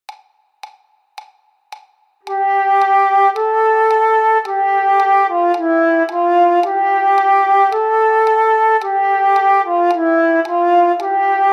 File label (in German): 1. Stimme